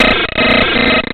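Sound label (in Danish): ødelagt telefon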